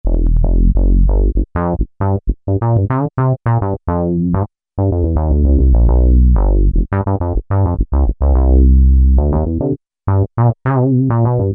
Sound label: Bass; RumpBass